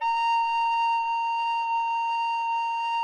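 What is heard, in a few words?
A#5B, koblliczek; SoundBytes - Early Patches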